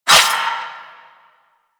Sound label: Shadow Melee Swipe; Warframe; Duviri